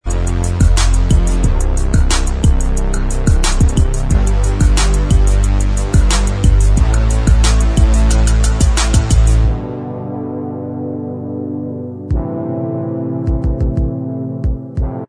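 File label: instrumental, backing track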